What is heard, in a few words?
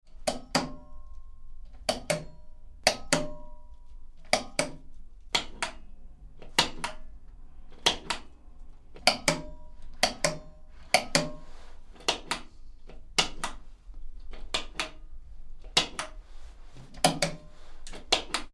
Light Pull Switch, Various light pull switches - on and off; Lamps; Household; Tom Hutchings